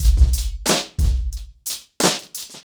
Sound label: 90BPM, acoustic drums; The Roots Drumloops